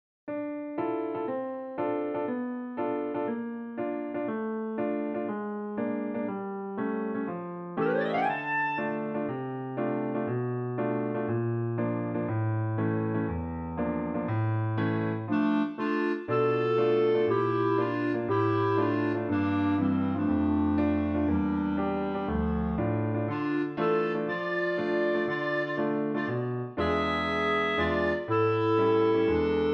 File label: 2 clarinets and piano; Nadja